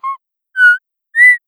Dilophosaur

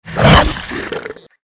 dino bite

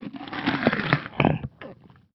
rapt bite

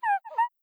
Dilophosaur

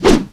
claw miss; utah

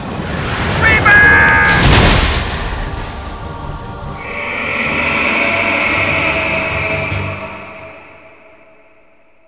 Windows Exit; Mechwarrior

